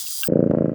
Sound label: combine button; buttons